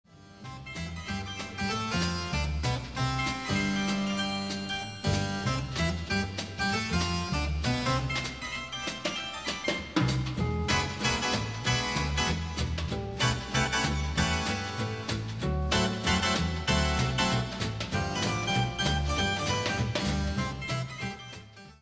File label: Swinging Spinet